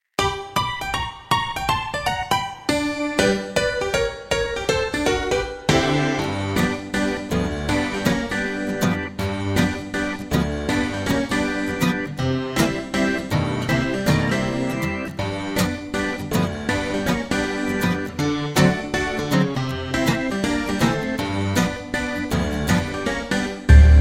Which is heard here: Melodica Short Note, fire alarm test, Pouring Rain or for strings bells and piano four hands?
Pouring Rain